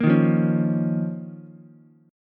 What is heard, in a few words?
F 7th Suspended 4th Sharp 5th F down Ukulele; strummed; JGuitar